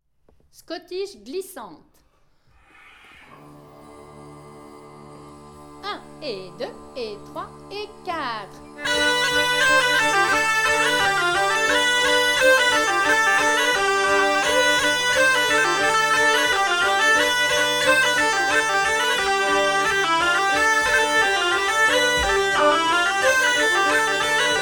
Scottish glissante

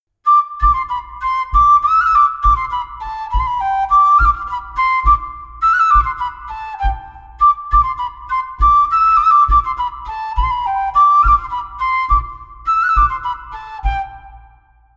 Montagnarde

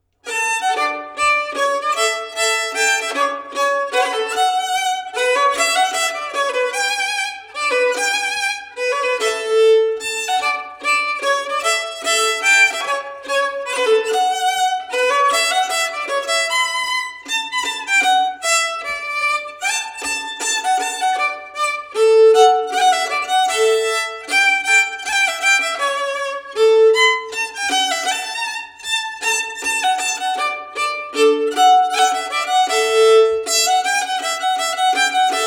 Mazurka

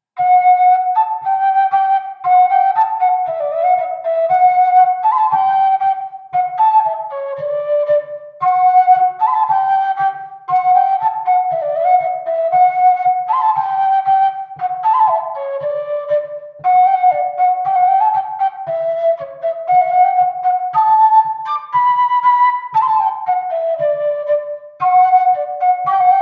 Bourree; Recueil De Raoulx

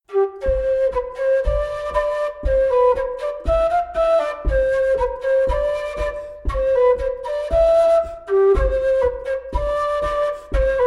Bourree parisienne bourree

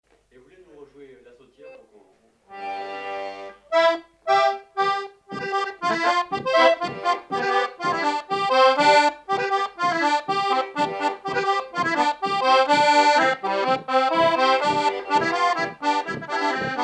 Sautiere; Sautiere de Gustou

